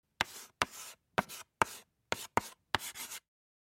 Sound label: Writing with chalk on a chalkboard